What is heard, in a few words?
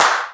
XV Clap, Roland XV-5080